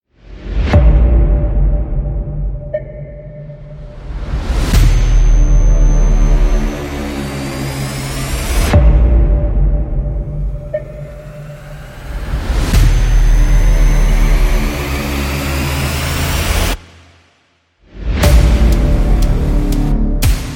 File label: alarm atom audio